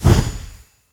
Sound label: efreeti towers released